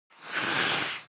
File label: reptile hissing